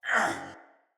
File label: Pain Soldier Male; Battle; Barks; Dialog; Sinecure Soldier Male B